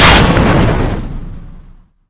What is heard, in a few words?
tank shell metal; wac